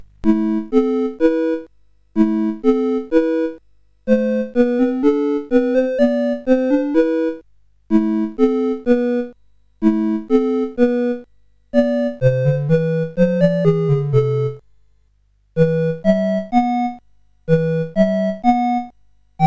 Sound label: CSG8580R5, GAMES; WitchSwitch